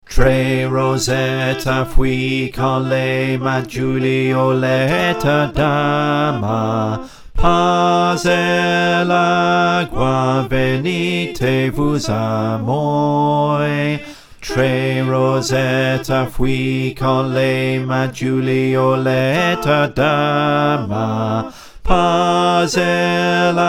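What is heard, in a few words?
Bass, choir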